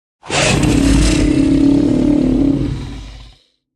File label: crocodile roar